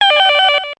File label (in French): Alarme